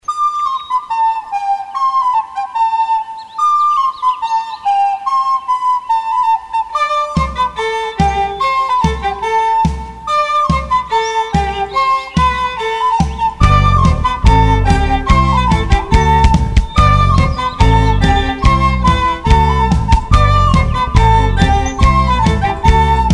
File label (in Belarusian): Melodyya z flejtaj; Sprat